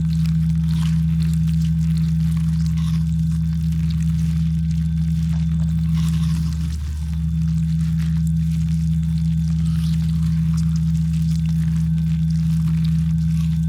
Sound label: ant ship science idle; biogenesis